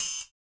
Perc Toys; Spectrasonics; Supreme Beats; World Dance

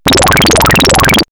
Energy Burst, sci fi synth fx; X-Static Goldmine

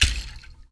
HAND, Afro Shakers, Ankle Pods

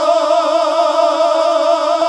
GOSP. AAAHH; Sound & Vision - Gigapack II CD 1 (Roland); HAL Gosp. Aaah 2

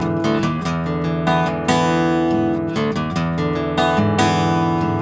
BARI RIFF, Blues Guitar Samples

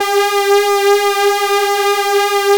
3100 G 5, Korg PS3100; Back In Time Records; Korg Universe VOL-1

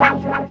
Chords Tones; Equipped Music; Loungin House

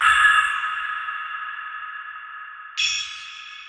Perc Metal Shards; Zero-G; Future Wave

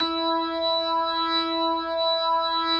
E4, B3

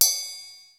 RIDE, DrumBase5000; Crypton; CFZ KIT 2

